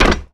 land metal solid, footsteps; player

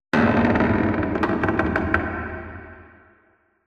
wood creak low; Half Life Alyx

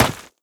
step run, footsteps, gravel; player

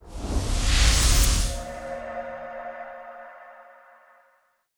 item showcase knife; Counter Strike Model Pack; CS:GO

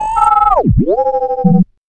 dog phrase; npc; Half Life 2; Episode 1

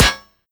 metal, bullet impacts; physics; Half Life Model Pack

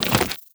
walk, antlion; creatures

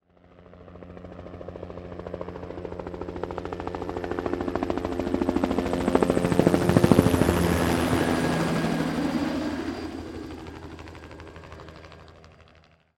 helicopter flyby; ambient; overhead

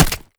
flesh npc, bullet impacts; physics; Half Life Model Pack

